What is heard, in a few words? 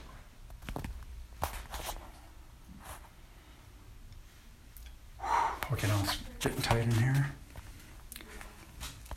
strange noise; EVP; EREBUS